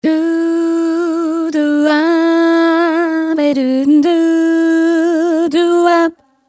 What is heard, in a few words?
Harmo solo